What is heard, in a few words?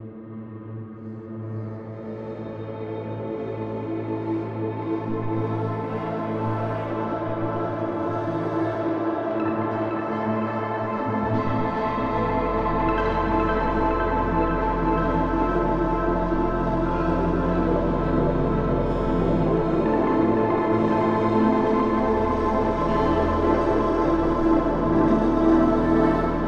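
Lab Rat DRONE, video game soundtrack; escape academy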